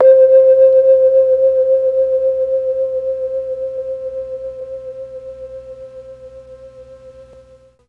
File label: C3 VIBES, mellotron; MKII